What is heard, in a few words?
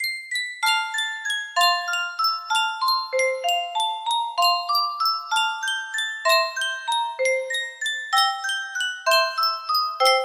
Sankyo music box